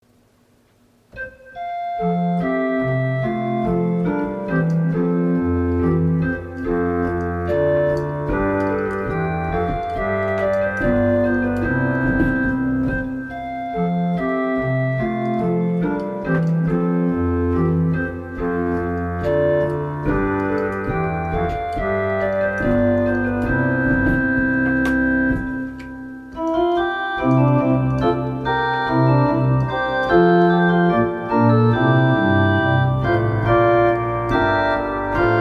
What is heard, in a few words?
postlude; JS Bach; Unbezeichnetes Stueck